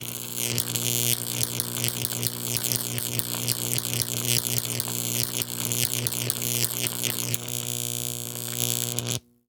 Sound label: High Voltage Electric Arcs; High Voltage Electricity; Hear and Now Sound; The Essential Collection